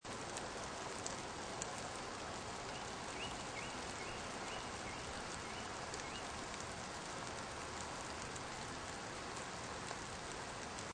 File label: Light Rain With Bird Loop, Light rain with occasional bird, seamlessly looping sound; Weather; Lynne Music